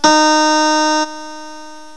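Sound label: HARPSICHORD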